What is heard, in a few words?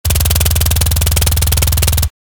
Tactical submachine gun full auto fire; guns